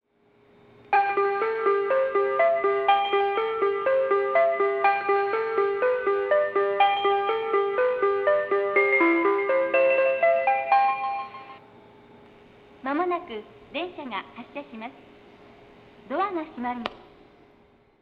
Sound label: melody, station